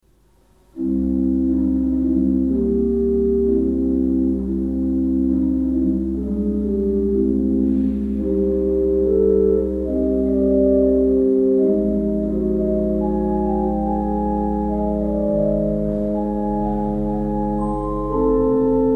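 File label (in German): Hohlflöte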